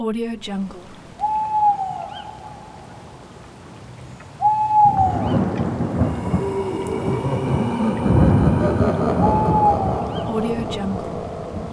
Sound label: halloween scary ambience